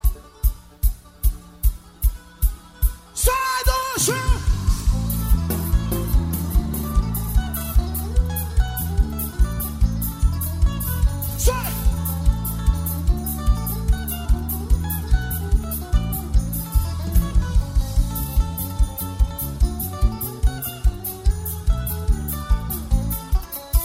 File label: Instrumental